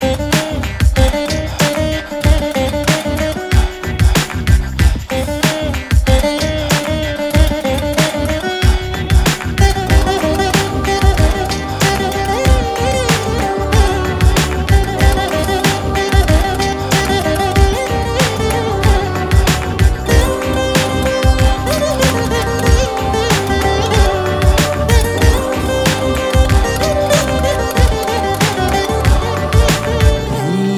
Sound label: instrumental Music